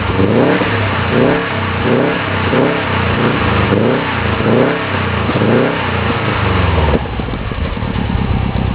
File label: scooby sport short